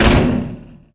cap open end; video games; doomsday; Source Engine; tf

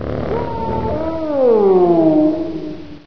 child die; video game; source engine; hl1; gonarch

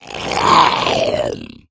hunter alert; source engine; left 4 dead 2

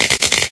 new spark, energy; video games; ambient; source engine; portal2

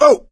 ledge hang slip; voice; source engine; left 4 dead 2; survivor

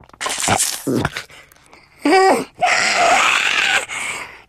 spitter lurk, voice idle

